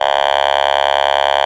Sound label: Otamatone; Ableton Foundation FreePacks; Foundation Glitch Toys & LoFi